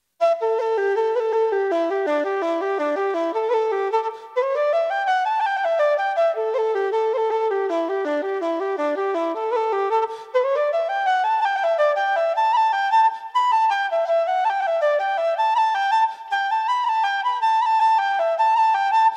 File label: Sandpiper